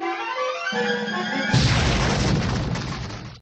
got jackpot music, jingle; WILLIAMS; INDIANA JONES; Indiana-Jones-Original

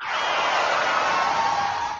applause; BALLY; CORVETTE; Corvette Original

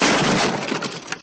wood destruction; voice

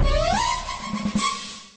narrow escape; sfx

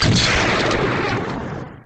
explosion; WILLIAMS; INDIANA JONES; Indiana Jones Original

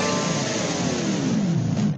loose, sfx; BALLY

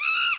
monkey; WILLIAMS; INDIANA JONES; Indiana Jones Original

